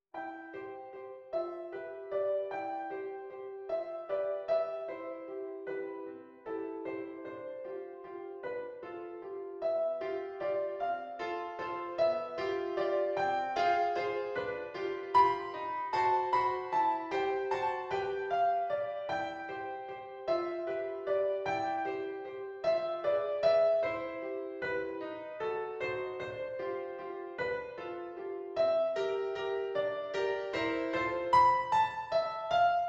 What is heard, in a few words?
Waltz